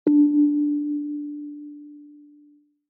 Flight announcement tone airport departure single beep